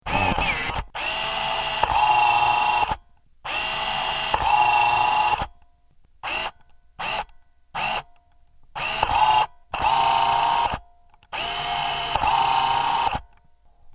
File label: Contact Mic Servo